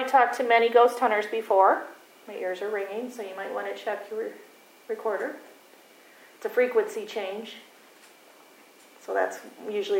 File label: WHISPER; Prospect Place; prospect place dresden oh